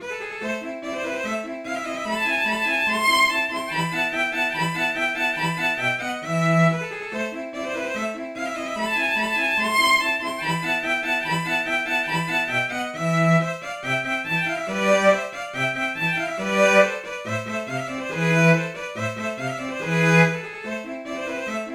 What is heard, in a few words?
cello